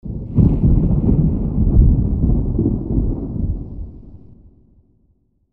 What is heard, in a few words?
thunder indoor; hydrosity